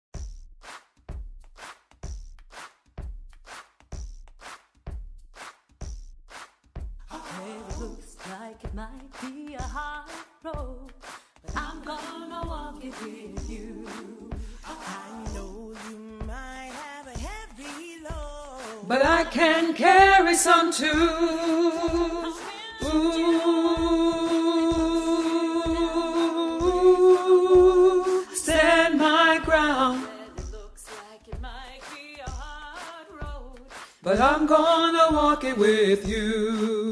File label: I'm Gonna Walk It With You, Soprano